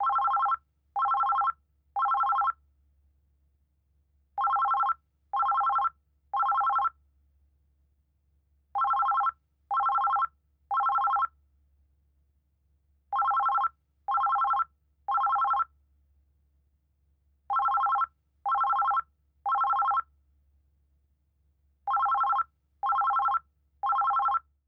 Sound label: Ringing